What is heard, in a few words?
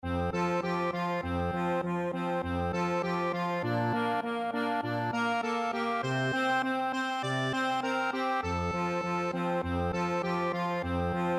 jingle bells, accordion